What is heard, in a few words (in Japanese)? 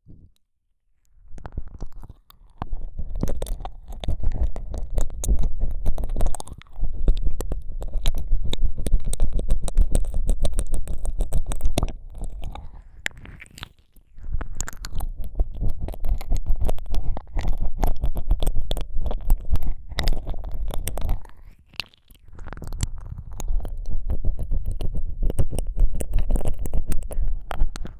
耳奥をぐりぐり耳舐め